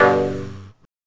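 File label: launch C major; Woody; LaunchGood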